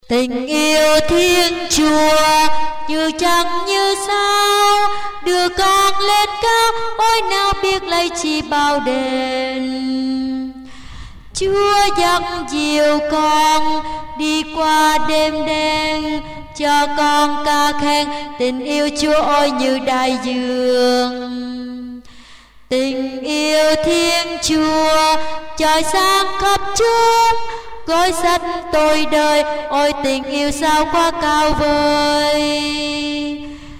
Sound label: tenor basso